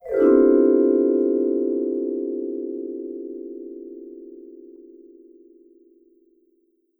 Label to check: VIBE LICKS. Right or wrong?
Right